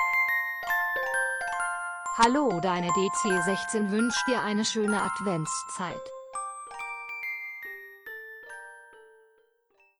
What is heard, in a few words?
snow; advent